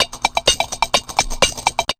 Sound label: 127 DRM, Fresh Disco House; USB Soundscan vol.20; AKAI